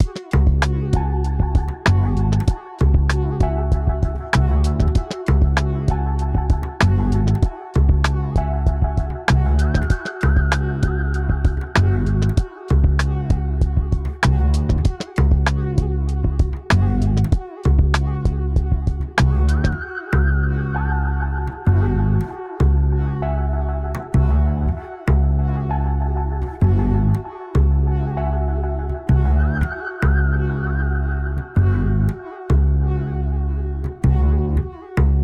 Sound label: 97 BPM F# Min, Melodies West Coast; Alley; Cymatics; GHOST (Beta Pack)